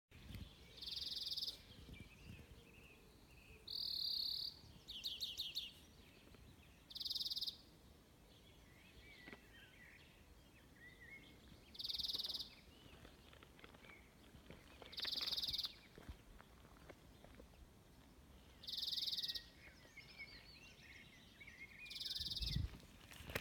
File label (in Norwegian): ukjent fugl